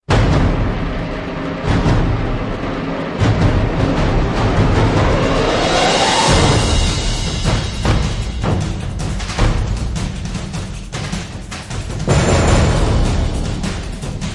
Drums Only Version

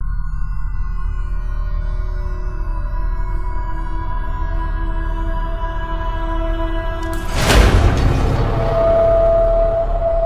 Head Off (Piano Version)

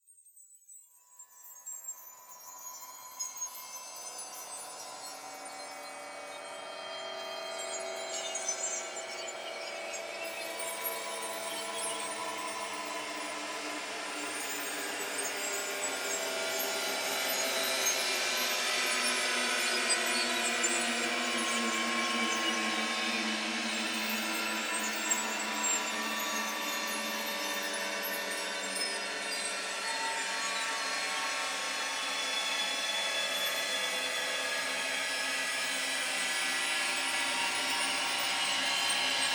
Drones Shiver; Gothic Storm Music; Advanced Sound Design 2012

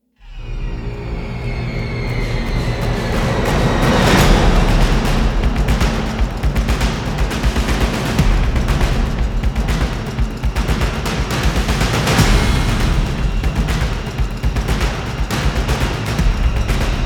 Gothic Action Percussion; Gothic Storm Music

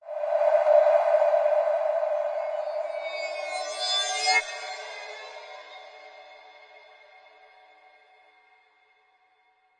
Sting Arctic Breath; Brand X Music; Brand X Music Catalogue - Editor's Essentials

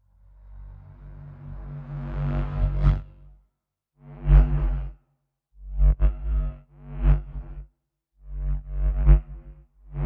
Storms Swarm; Gothic Storm Music; Advanced Sound Design 2012

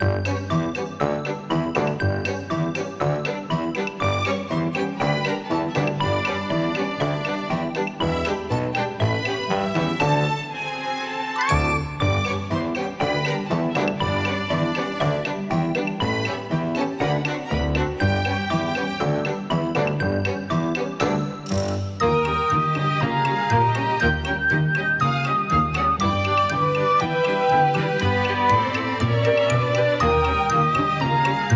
Fools Ambient

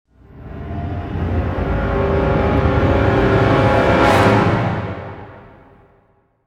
Sting Brass Flare; Brand X Music; Brand X Music Catalogue - Editor's Essentials

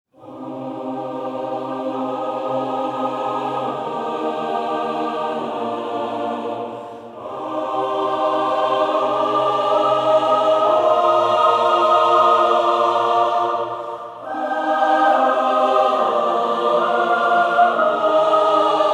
Choir Only

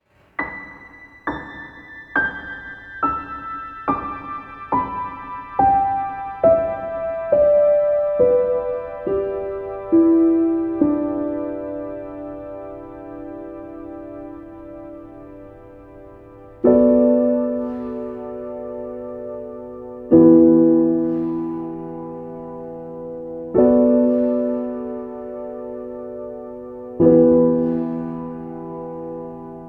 Peaceful Piano Music

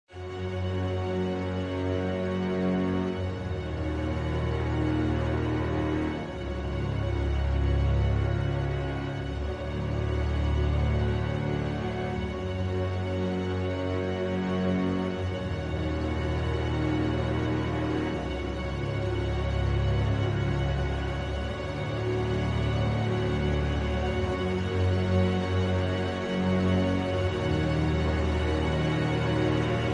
No Vocals, Epic Action & Adventure

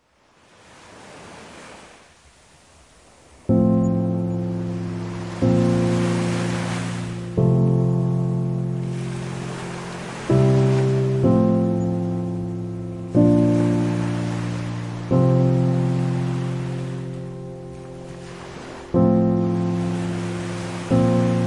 Piano on the Ocean

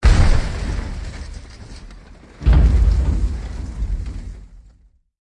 Hit FX Rubbish; Brand X Music; Brand X Music Catalogue - Editor's Essentials

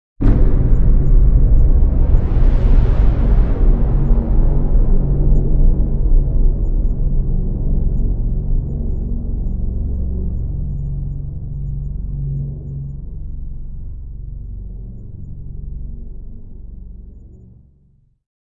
Boom Drone; Epic Tools vol. 1

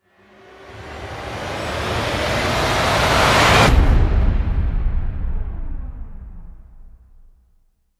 Wide Rise; Ninja Tracks

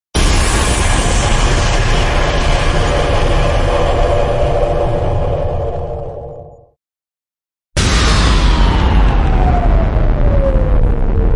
Impacts (Distorted); Epic Tools vol. 1

